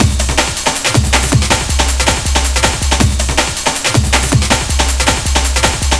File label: 160, Drum Loops, Drum & Bass; Total Drum&Bass